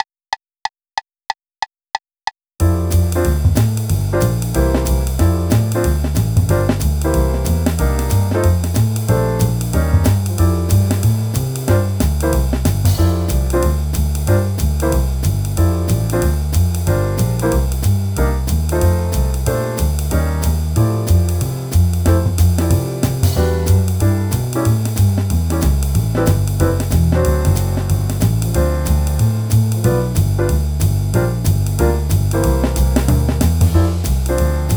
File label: Rhythm Changes in F